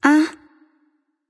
confused light; smite; voicepack; hel

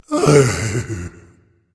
content berserker; cuchulainn

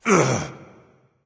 lift grunt; smite; ahpuch

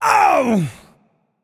nv ouch; cernunnos

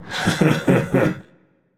heh heh; smite; ra

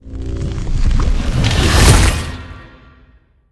activate; smite; god tiamat

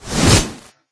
robotics melee energy mace swipe; smite; vulcan

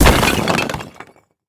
Rock Crash; smite; kumbhakarna

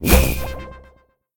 whoosh; smite; raijin

